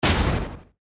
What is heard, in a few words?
Burst Airy